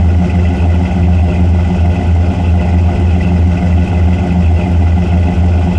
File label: Dodge Ram Engine Loop; Vehicles